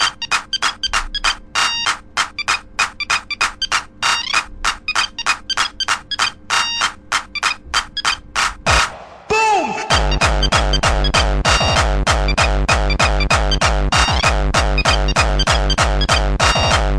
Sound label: New Nokia Hc Remix